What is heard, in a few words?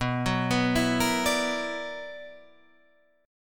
B-Minor Major 11th B down Guitar Drop D